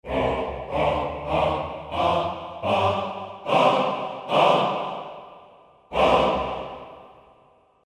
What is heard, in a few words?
Reason 10 Humana Ah Staccato